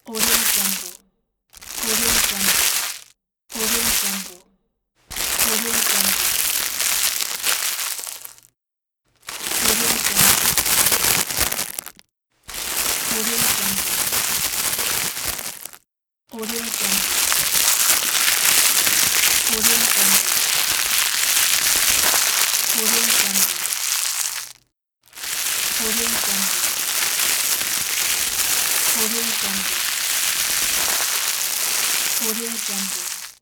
rustle of the package

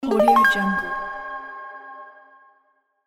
menu select app enter

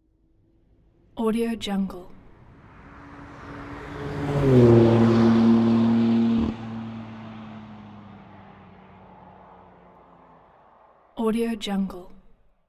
passby sportscar single; soundbits-soundfx

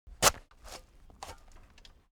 garden digging; foley; smartsoundfx

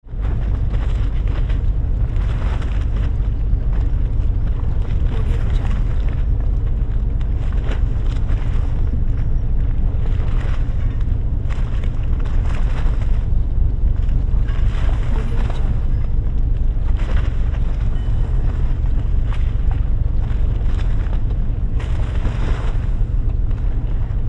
large ship engine idle with water splashing

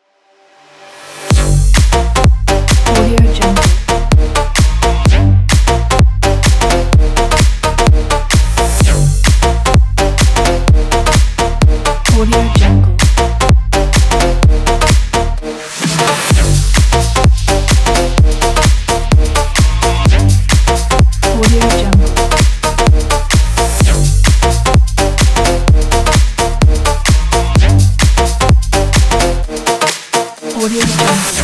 future house

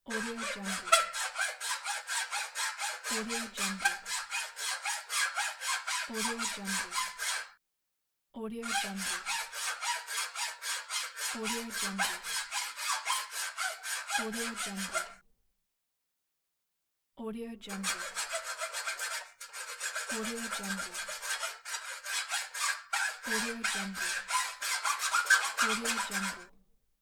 saw of metal

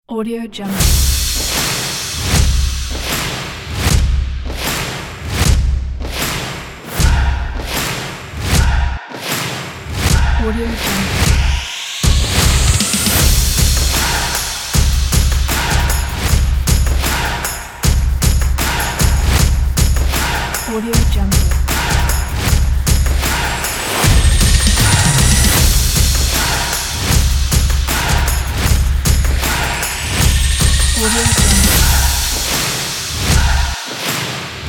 rock action drums

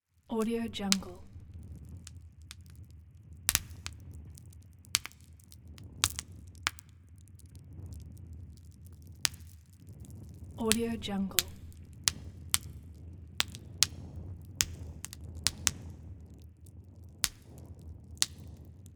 fireplace

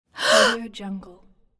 female gasp

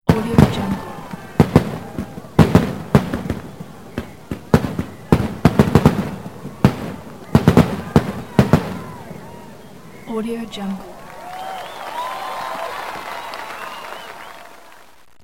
fireworks in the square

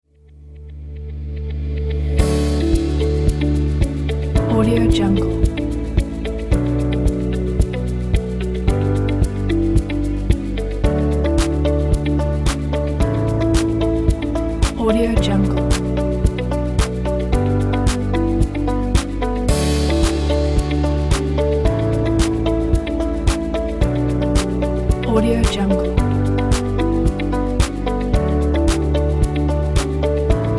ambient motivation; elijah-studio

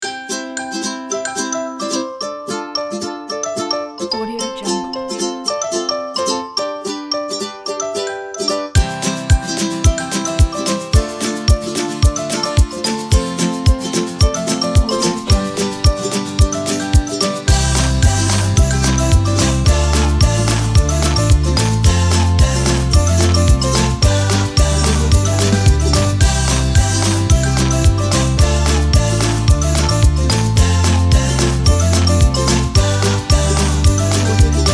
bestseller upbeat

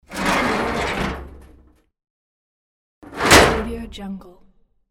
metal drawer

